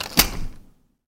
door unlock sound

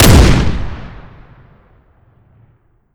ax50 fire; albanyknight

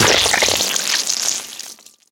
chainsaw impact flesh

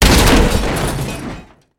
metal crash

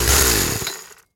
chainsaw deselect

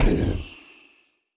fire, backwards; css

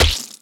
bat hit flesh

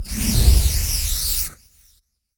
fire, backwards; css

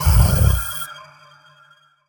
fire, backwards; css

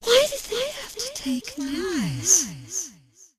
siren voice

